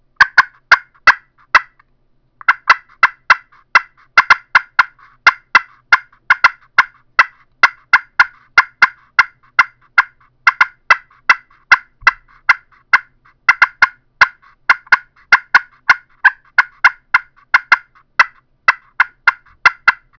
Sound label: super bronze cutts, turkey; Southland